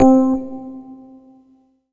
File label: Yamaha TG77 Rhodes C4